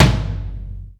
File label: AMB T, HALL KITS; Crypton - DrumBase5000